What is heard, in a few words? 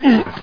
ladder; Daikatana; superfly